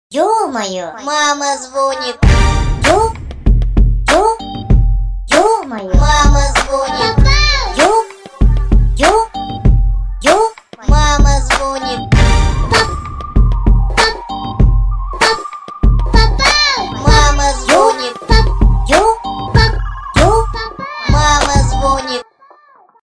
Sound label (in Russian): Remix; Mama zvonit; golos